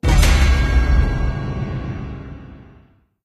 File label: freespins win small; Dragons Myth